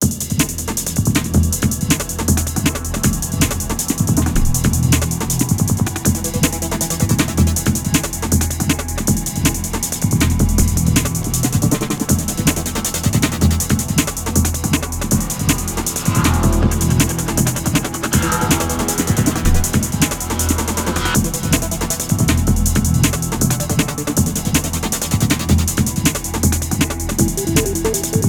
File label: electronic drone